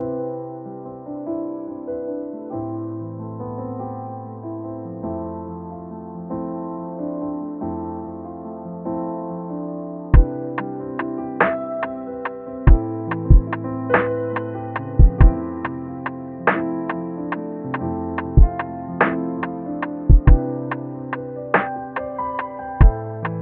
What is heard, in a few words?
sleepy